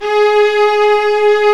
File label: String Vlas Sect Agitato